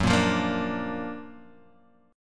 F sharp Augmented Major 7th F sharp Guitar Drop D; 4,1,3,3,3,x

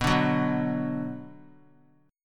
B-Minor; strummed; JGuitar

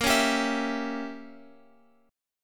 A sharp Diminished 7th A sharp, Guitar Open F; x,x,10,8,10,11; strummed

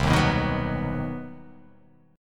C Minor Major 7th Flat 5th, CmM7b5 chord; strummed; JGuitar

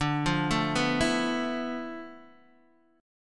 D-Minor 6th Add 9th, D, Guitar, Open D

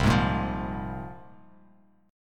C 7th Suspended 4th Sharp 5th; C7sus4#5 strummed

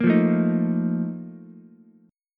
Bb Major 7th Suspended 4th, Ukulele; strummed; JGuitar

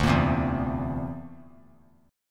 D 7th Suspended 2nd Sharp 5th D; D7sus2#5 strummed

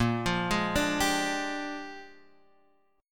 Bb 13th, Guitar, Bb13 chord; Bb13 arpeggiated

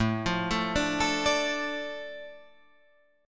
A Suspended 4th Sharp 5th, Guitar Drop D

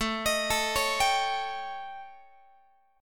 A 7th Flat 5th Guitar; A7b5; arpeggiated

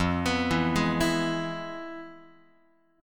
F-Minor Major 7th Flat 5th, Guitar; FmM7b5 arpeggiated; JGuitar

